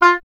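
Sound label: oboe, wind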